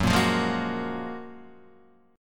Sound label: Gb Major 9th, down, Guitar, DADGAD